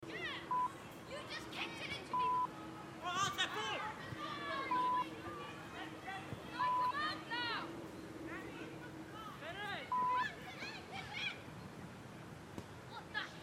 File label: Kids 5 a Side Football Park